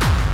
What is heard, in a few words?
bombo, kicks, Hardcore